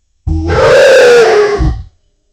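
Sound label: tiger roar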